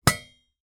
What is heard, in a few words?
household teapot silverware lid close; zapsplat